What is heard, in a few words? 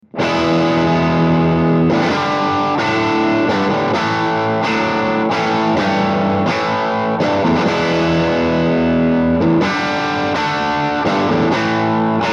Rocker 15 Dirty; Orange